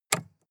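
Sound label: sports car interior lightswitch hard; verkehr transport; autos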